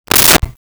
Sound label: Open Microwave Door; Appliances